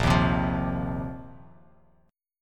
A sharp Suspended 2nd Suspended 4th A sharp, Bass Standard; strummed; A#sus2sus4; JGuitar